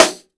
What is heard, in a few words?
snare drum, jungle snare chromatic; Best Service ProSamples vol.43; Real Drum Kits